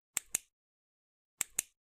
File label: flashlight; روشن شدن چراغ قوه